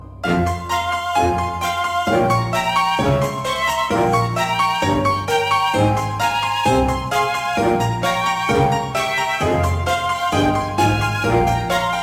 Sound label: Bass; Fat Princess